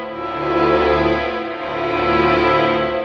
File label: strings, Sad Loops